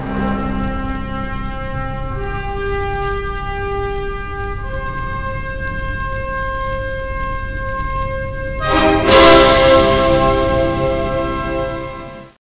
Tusch; Die Odyssee german

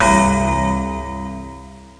Uhr

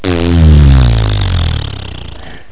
Flugzeug

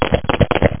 wuerfeln; sunshine

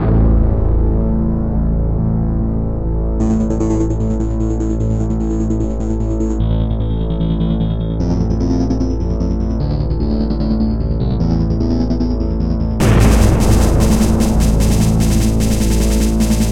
risiko musik

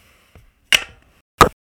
holz hack

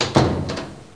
Tür

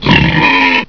grunz

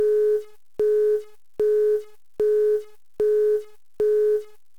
besetzt; isdn

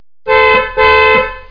hupe; wintron

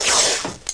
Matraze

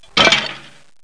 buchse

